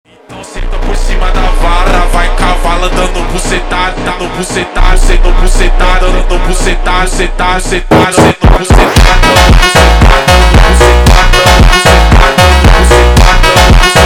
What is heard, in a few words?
Slowed, phonk music; funk